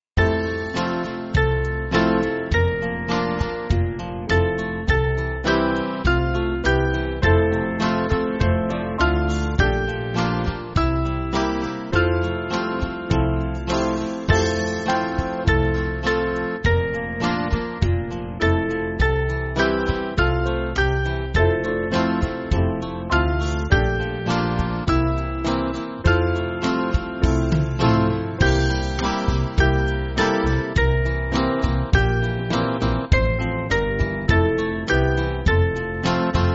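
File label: Band, Music Backing Files for Churches; Lead Kindly Light; Sandon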